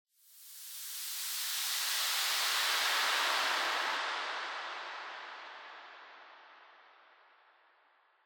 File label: City Ruins Stem; Epitasis